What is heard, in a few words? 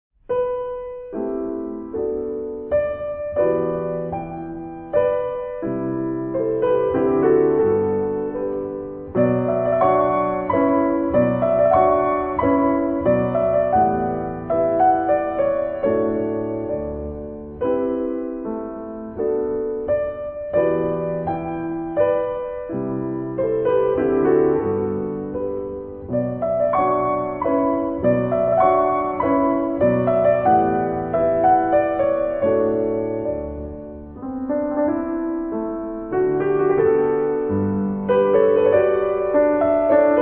royalty free music minuet